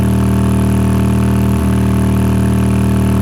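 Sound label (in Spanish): MOTOR MARCHA YBR, motorcycle; YBR125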